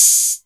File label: 808 Hat O